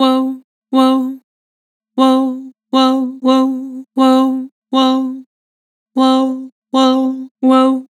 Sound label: Woah, Vocals, Vibrato; Harmor Vocals